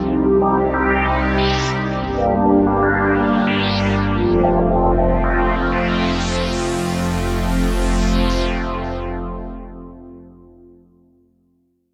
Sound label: Big Pad; Dr. Fink's Funk Factory